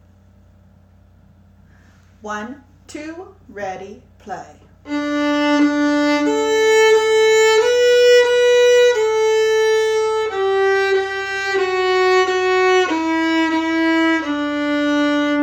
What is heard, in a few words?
Twinkle Key of D; Twinkle Theme